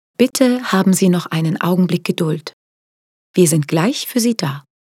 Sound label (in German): telefonpaket warteschleife